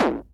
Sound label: BD Distortion, Bassdrums; Vengeance Samples